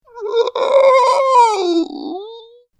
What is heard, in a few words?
Pathetic Monster; horror